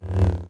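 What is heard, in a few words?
lightsaber jedi swoosh medium; weapons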